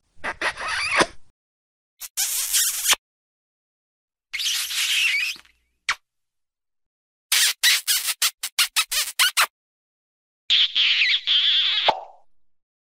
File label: kiss